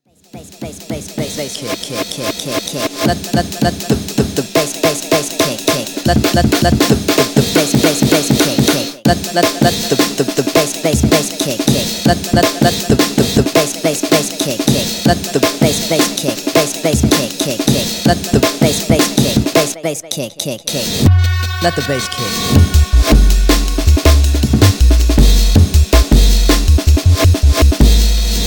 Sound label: Bass Kick